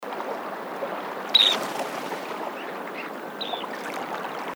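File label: dunlin, prerow north beach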